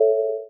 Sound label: Lantern